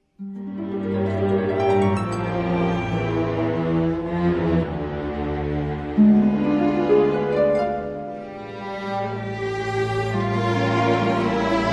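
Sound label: Scène de la Csárda for violin & piano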